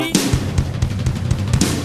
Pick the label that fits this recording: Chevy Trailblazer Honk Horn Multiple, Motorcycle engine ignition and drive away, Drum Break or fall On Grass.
Drum Break